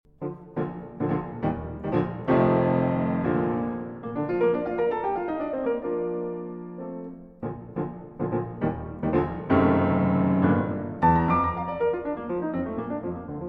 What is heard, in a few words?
Piano Variations in F Major